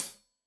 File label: Hat Tight, Hi-Hat, Cymbals; Deeply Sampled Drums